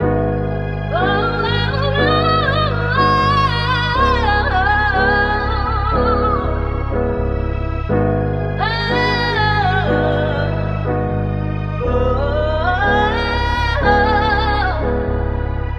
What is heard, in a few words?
voice strings piano the saddest funk, Loops, Sad